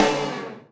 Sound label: Combo Hit; Trapped - Ken Combo 2